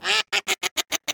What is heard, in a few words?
duck laugh